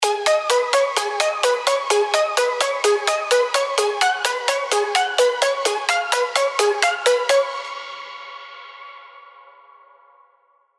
PROG ARPS G#m

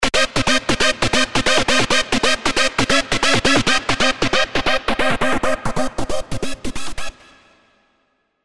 Stab; Valhalla; Spire

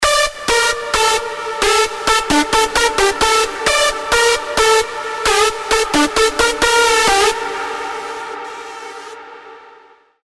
Big Room Leads D WET (132 BPM)

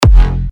Big Room Kick; F

